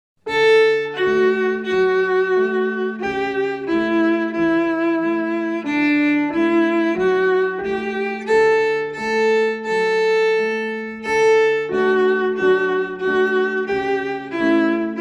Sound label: Folk Song; Lightly Row